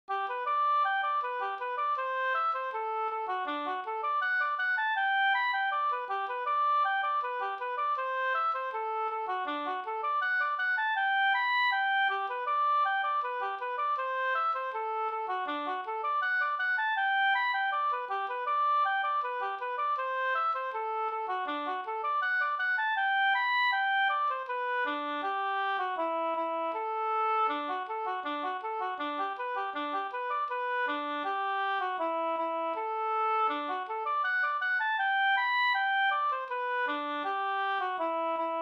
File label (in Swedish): schottis 4-4; e Karl Styfberg Up